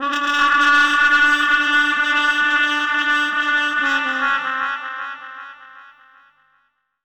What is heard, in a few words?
Tight Brigade, Brass; Zero-G Smoke